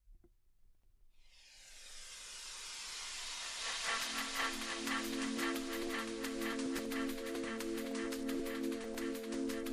Drum and Bass Jungle; UK Bass Radio; Afternoon Liquid sessions

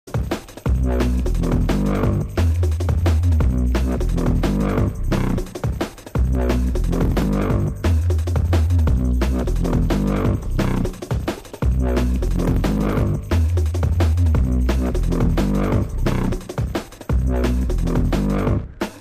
Drum and Bass Jungle and Old Skool; UK Bass Radio

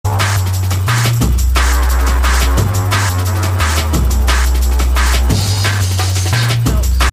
Drum and Bass Jungle, UK Bass Radio

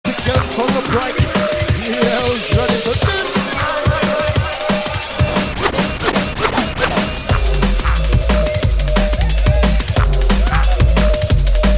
Drum and Bass Jungle, UK Bass Radio

